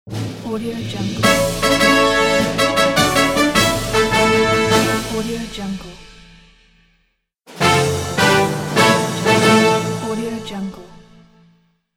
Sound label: fanfare